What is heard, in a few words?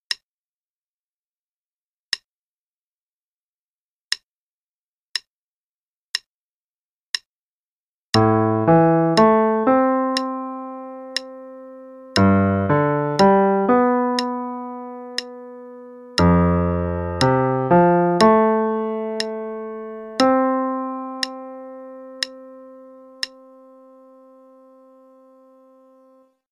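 LH only, qn=60, piano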